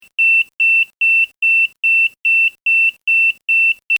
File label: fast pulse; 2.9KHz Fast Pulse Tone